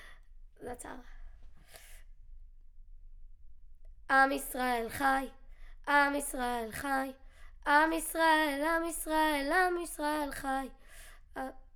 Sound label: Children Choir; Studio Bekol Children Choir